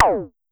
Pollard Perc, Syndrum

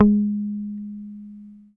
HOFNER, Bass

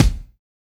BEAT KICK

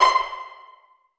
STRING PIZZ F#4; Orchestra; Sound On Sound - Peter Siedleczek's Orchestra

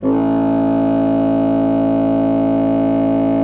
A1, bassoon; Xsample VOL-6

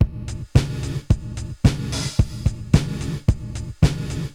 80s Gated Verb Korg MR-16 (110BPM), cyborg beats; musicradar

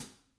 hat, Vintage Timetraveler kits; Sonic Reality - Vintage Timetraveler

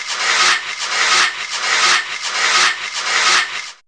SSSTTT, Fairlight CMI IIe Effects

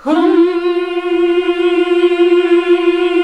HMM F#2, classic vox

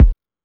Techno F, Drum Machines, EMU XL-7

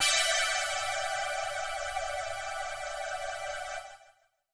125 pad E, Keys, Nu Jazz House

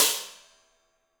HiHat & Crash, Roland TD-20; HiHats

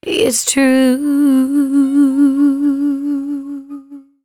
113bpm C#, Velvet Vocal; Zero-G

